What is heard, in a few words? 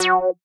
synth